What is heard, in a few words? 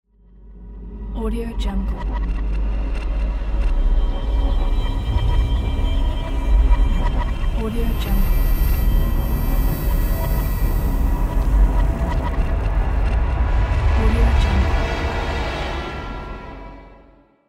emergence